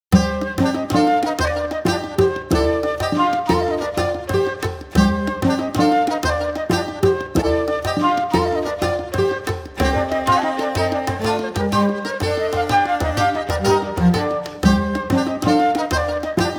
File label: folk dance